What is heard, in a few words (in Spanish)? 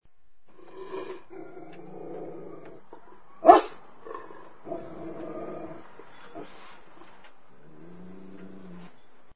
PERRO, ANIMALES; Sonidos/animales